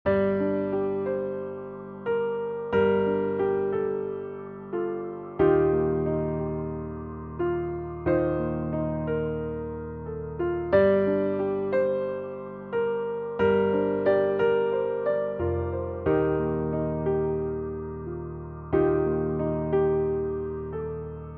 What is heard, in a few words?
Ringtone